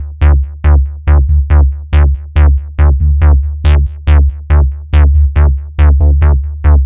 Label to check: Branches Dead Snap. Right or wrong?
Wrong